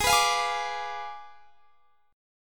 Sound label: G sharp Diminished 7th G sharp; G#dim7 Chord